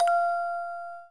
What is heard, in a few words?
orgel